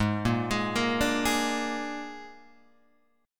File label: G sharp Suspended 2nd Flat 5th G sharp down Guitar Open G, chord